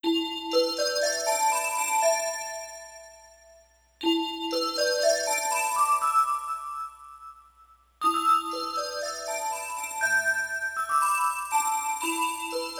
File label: song